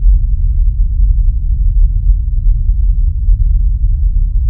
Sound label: noise